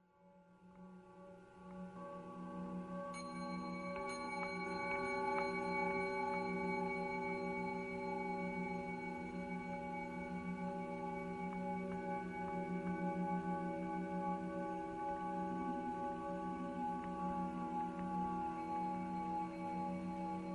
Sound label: circle of singing bowls; gongs